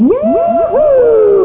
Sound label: yahoo